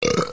eructo

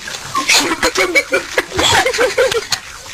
jajajaja

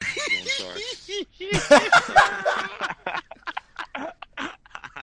jiji

